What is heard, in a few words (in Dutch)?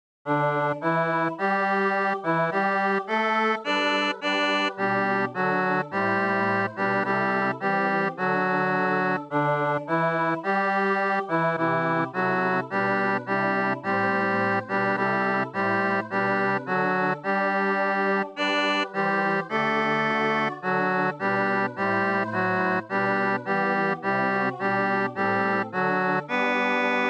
tenor; alle eer